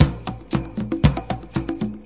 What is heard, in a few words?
sleighbells, 116 bpm; 111 to 120 bpm